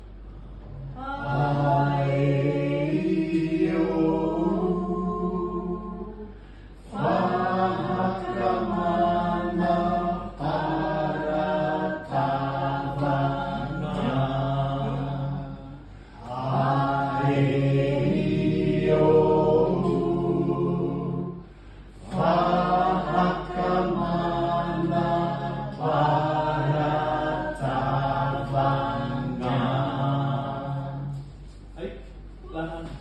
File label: ALPHABET CHANT; langue marquisienne; TE NUINA RETA – L'ALPHABET